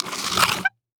Foley Footstep High Heels Patio Stone Scrape And Screech; Foley Footsteps